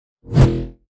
Beam Knife, Weapon

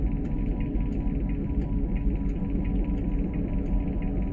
Wave Base Station, ambience

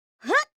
FEMALE attack

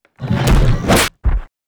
TWEEZER WAR ATTACK

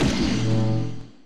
briefing

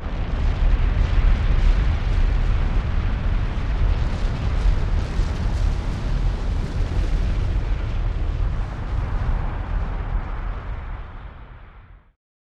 volcano, ambience

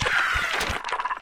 STINKBUG WAR IDLE

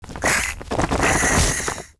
ratmoth; Mob

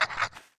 YOUNG FLEM PEACE WALK

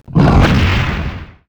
war attack; DAGAN; Mob

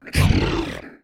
Damage; Rust; Mob

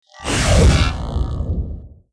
power up; Mob

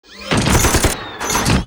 WAR ATTACK; Mob

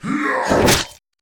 attack; MetalNight; Mob

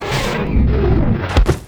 VOLCANIC LAVA W DIE

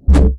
beam spear, Weapon

